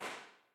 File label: TLM 170r Omni, Impulse responses; Henry Olonga; The Beautiful Room